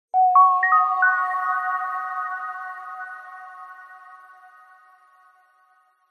multimedia game sound zen game soft warm relaxing twinkle tone